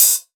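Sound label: open hi-hat vertical; maschine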